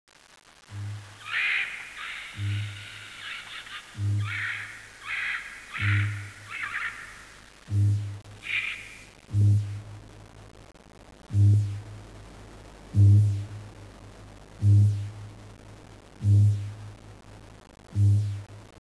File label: kakapo